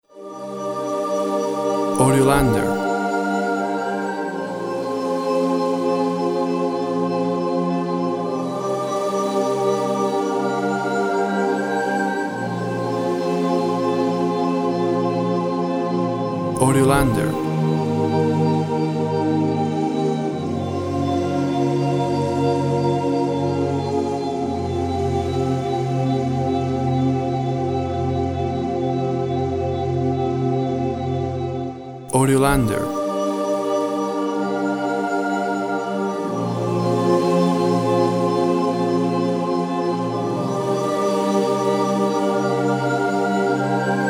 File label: Ocean Cry